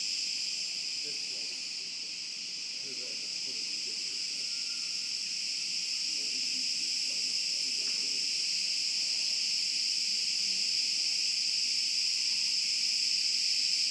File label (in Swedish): naturlarm